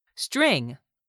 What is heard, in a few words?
string